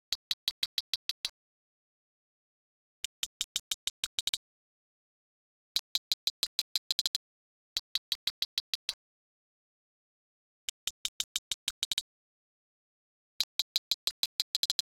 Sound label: sperm codas, sperm Whales